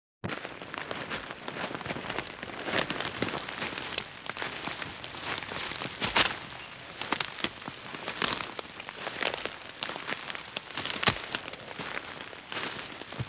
one person walking, Grass and Dirt; Butterfinger Video Sound Effects

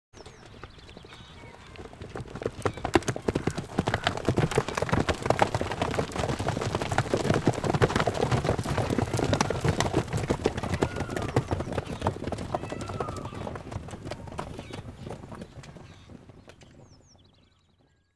horses galloping

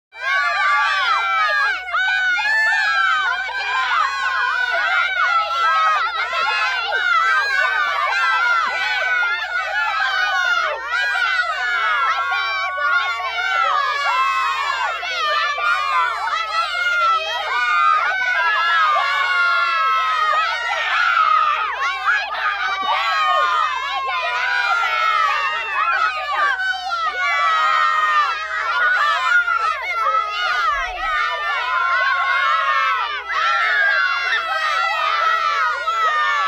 small group kids, crowds kids; Asian Show Sound Effects

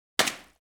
object dropped; fights bodyfalls; Asian Show Sound Effects

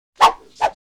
swish double swish; crashes swishes impacts; Asian Show Sound Effects

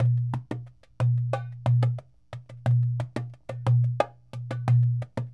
Dumbek

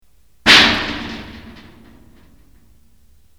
shelf thump

